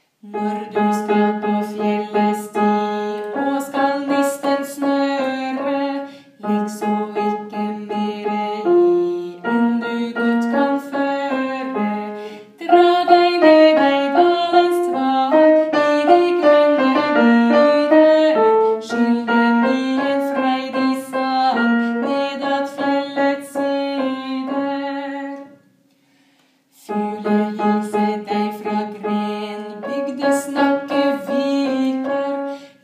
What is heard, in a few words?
Fjeldesti Tenor